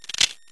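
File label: Artifact; Treasure Galaxy (1994); Learning Company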